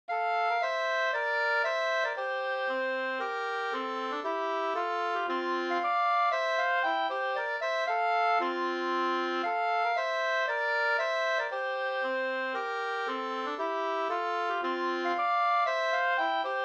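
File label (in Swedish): polska, tvåstämmig; Roslagen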